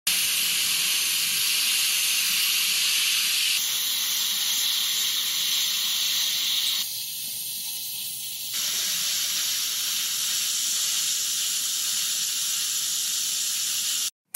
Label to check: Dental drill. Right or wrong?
Right